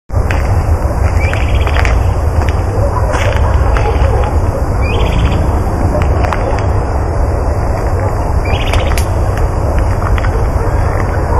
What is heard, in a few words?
Myiophobus fasciatus; RN Otamendi